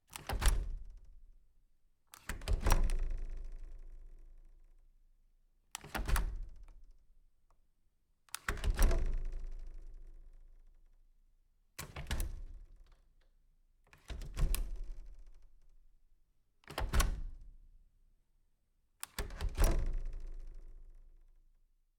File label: latch opening closing